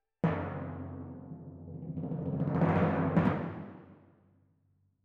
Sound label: Twin F Timp